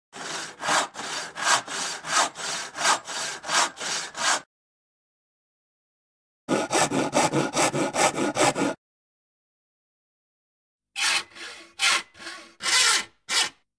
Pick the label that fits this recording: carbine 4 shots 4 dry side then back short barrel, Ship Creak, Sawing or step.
Sawing